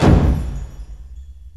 STACKED PERC

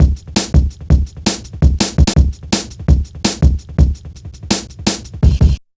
Funki Tuff, Jungle, Drum Loops 166.5

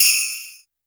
bell; Roland R-70; Perc

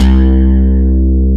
DEEP BASS C3; MX+BASS

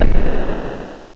SNARE

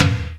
ROCK GUITAR

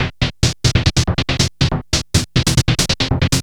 Treat Guit, Industrial Nasty

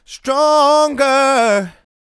Stronger, Vocals, Male

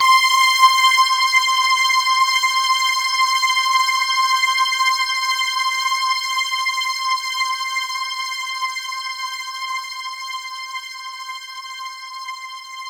C5, CLUB ORGAN, Roland JV 2080

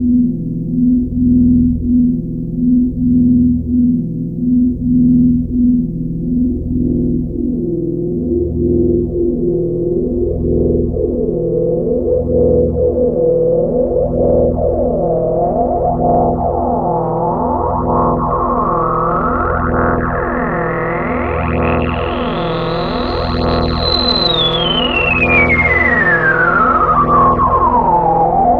ATMO, Special FX Twisted; USB Soundscan; AKAI

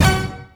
old skool stab

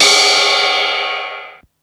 Ride Filter, Ensoniq Mirage drum machine

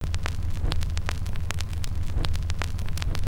Crackle, Vinyl Noise

